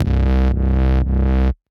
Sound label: Bass Sidechain Fuzz